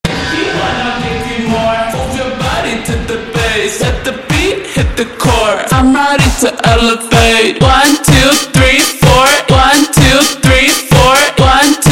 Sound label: super Slowed, funk